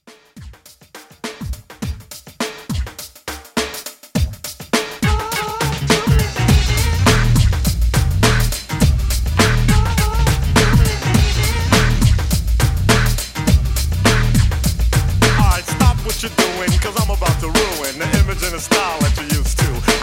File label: 90s Hiphop Re Drum 103